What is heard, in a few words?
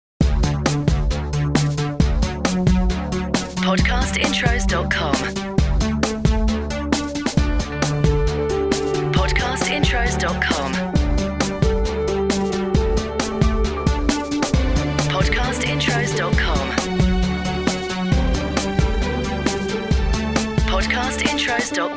CORPORATE; Podcast Watermark